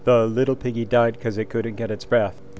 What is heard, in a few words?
Piggy Chanted